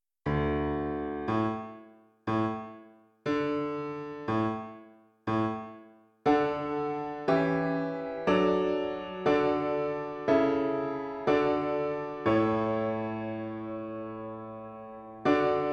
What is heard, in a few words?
Piano